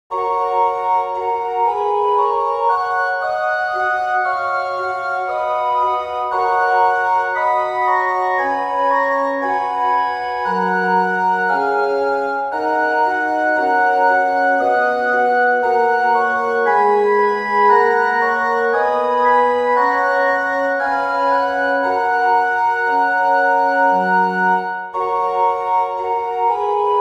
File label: organ; gluck